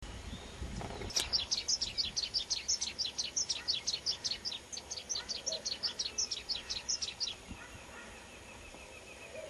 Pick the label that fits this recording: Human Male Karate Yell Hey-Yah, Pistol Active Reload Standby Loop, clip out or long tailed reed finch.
long tailed reed finch